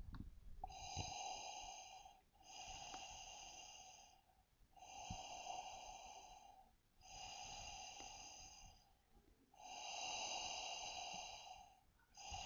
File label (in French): respiration